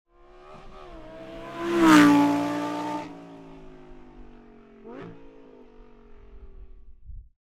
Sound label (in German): Vorbeifahrt; ABT XGT; ABT Sportsline; Limited Editions